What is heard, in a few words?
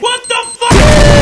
wtf boom; cstrike